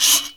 ETHNIC PERC; Roland LA

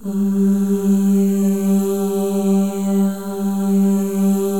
MOVING V1, Symphony of Voices; Spectrasonics

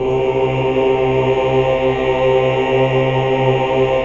AAH

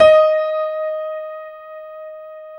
PIA MICRS, MICRO-PIANO, Roland; Sound & Vision - Gigapack II

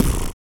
UP AIR, Vocal Blues Soul; Spectrasonics; Vocal Planet

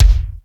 D70 BD; D70 DRUMS; Crypton - DrumBase5000

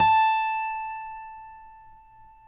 Finger A 5 L, Guitars; Spectrasonics; Hans Zimmer Guitars VOL-1

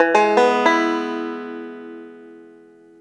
banjo arp E1; AKAI S-Series CD-ROM Sound Library VOL-2

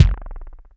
BD, Bassdrums, Roland TD-20

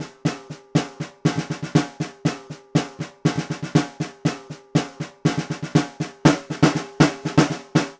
Vintage Ludwig Snare, Percussion

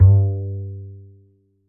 A, Upright Acoustic Bass; Larry Seyer; SWITCHABLE POSITIONS

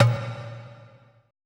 E LOG #A, ETH DRUMS

